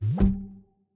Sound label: reel Bounce; netent; doublestacks